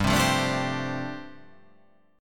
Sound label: G 7th Suspended 2nd Suspended 4th G